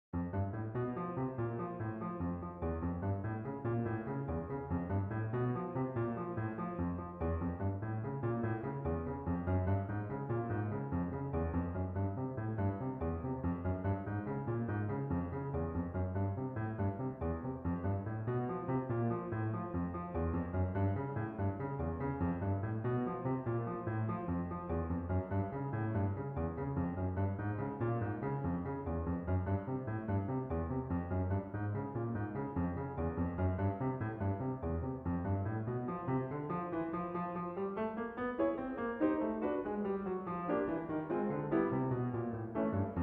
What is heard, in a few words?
guitar caprice